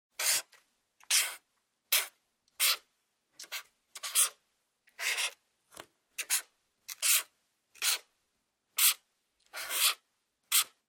Felt Tip Pen Write

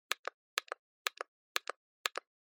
Cell Phone Hardware Button

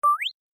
Motion Pop

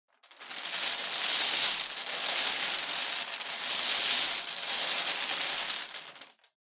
Bingo Machine Spin Long

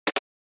SNES RPG Switch

